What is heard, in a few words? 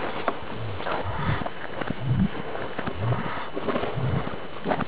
heartbeat